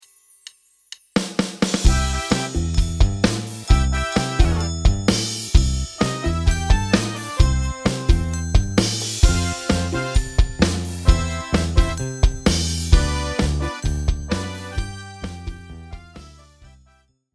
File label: Jingle Bells Samples